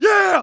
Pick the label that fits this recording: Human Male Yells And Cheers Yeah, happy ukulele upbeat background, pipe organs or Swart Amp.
Human Male Yells And Cheers Yeah